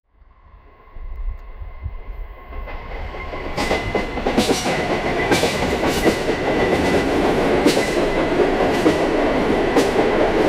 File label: train approaching